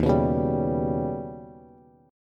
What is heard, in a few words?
Eb Augmented 7th Eb down Bass; Eb+7 strummed; Eb+7 Chord; JGuitar